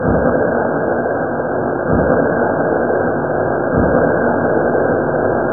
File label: Blood Stained Giggle; Spooky's Jumpscare Mansion HD Renovation; ps4